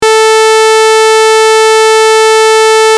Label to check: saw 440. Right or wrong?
Right